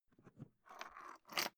briefcase plastic open metallic rattle; industrie wirtschaft; bueroarbeit

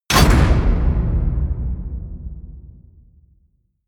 medieval click generic confirm heavy, metall; hits explosionen

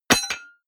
drop metal stone; hits explosionen; metall

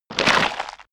stone gravel clatter; natur-tiere; steine

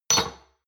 shot glasses stack medium; berufe; gastronomie; soundpool

